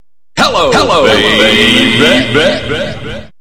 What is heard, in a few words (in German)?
Jingels